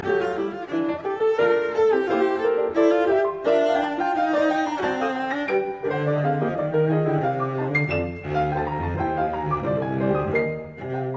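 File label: Cello Sonata, Vif et Joyeux; Milhaud; Op 377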